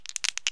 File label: Shaking Dice in Hand, Casino